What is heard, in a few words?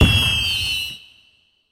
salute; Rescue Team3